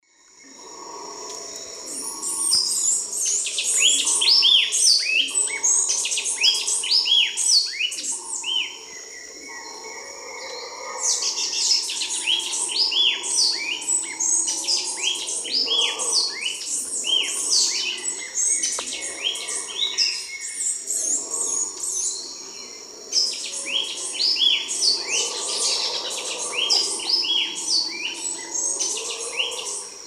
Eucometis penicillata, calls and song; S. America; Eastern Lowlands

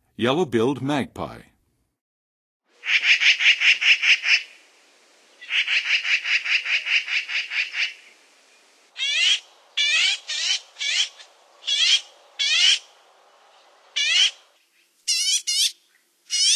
Yellow-billed Magpie, Songs of Birds; North America; Western USA; Western USA Songbirds

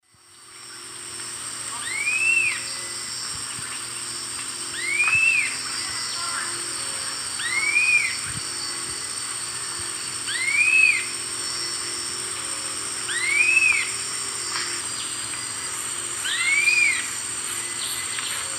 Bucco macrodactylus, song; S. America; Eastern Lowlands

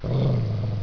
DOG, animal

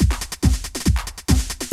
techno 140

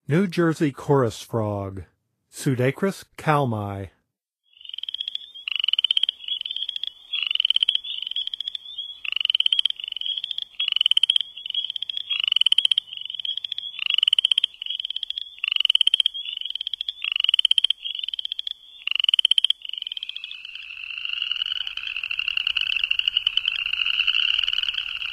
New Jersey Chorus Frog; N. America; Songs of Frogs & Toads of North America

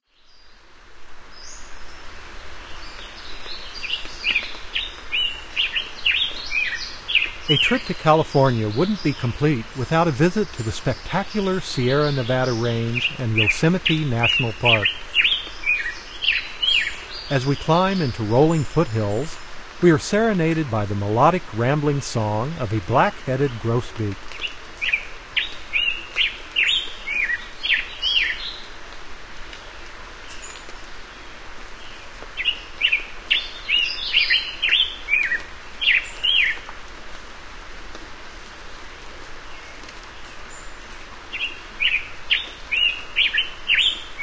Black-headed Grosbeak; North America; Common Western Species; Know Your Bird Sounds

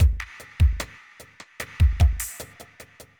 evil beats 75

